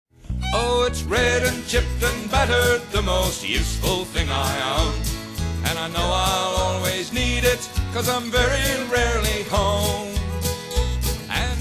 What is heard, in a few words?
Old Enamel Mug